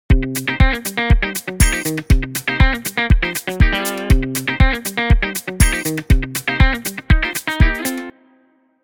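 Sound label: Instrumental Funk Short Version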